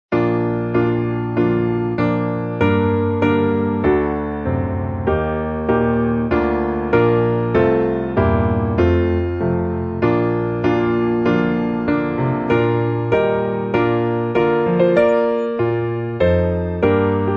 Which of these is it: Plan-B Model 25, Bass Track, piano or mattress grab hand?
piano